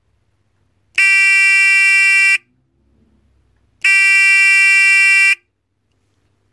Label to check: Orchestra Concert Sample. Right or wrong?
Wrong